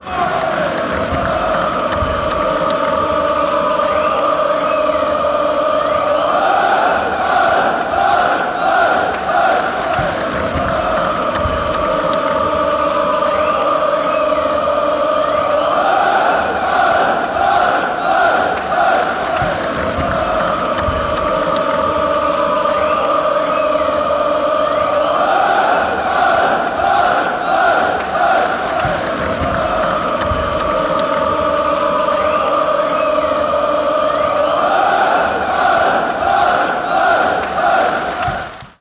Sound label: wooooaaaa hey, fan chants